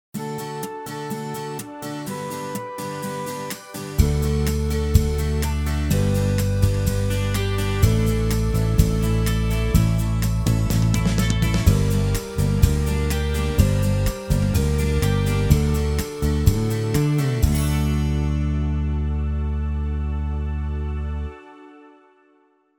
Glory to you basic backing; Backing tracks

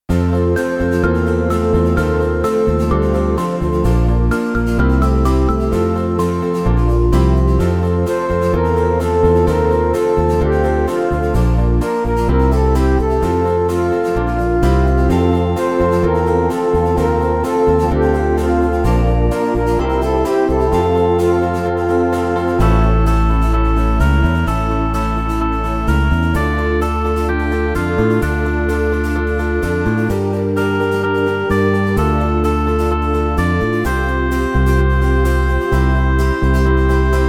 BACKING; With joy you shall draw water

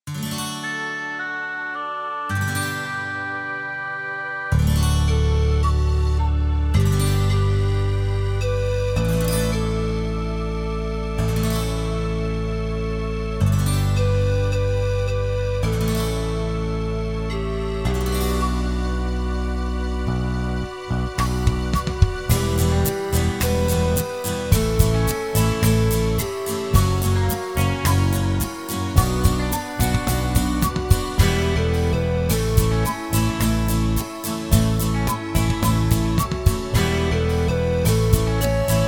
Doxology backing; Backing tracks

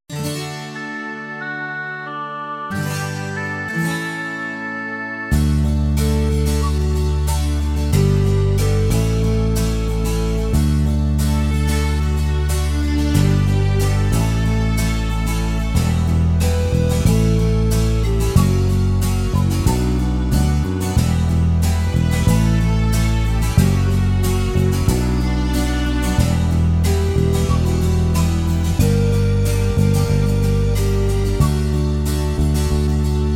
Lord have mercy backing; Backing tracks

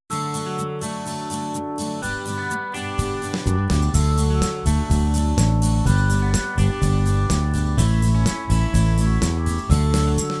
Holy holy basic backing; Backing tracks

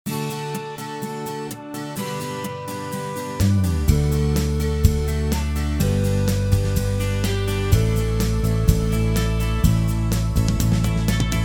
Glory to you basic with verse backing; Backing tracks

